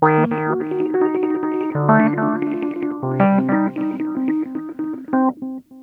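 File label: spacey rhodes; PlatinumPhattCD2; E-MU Producer Series Vol. 8 – Platinum Phatt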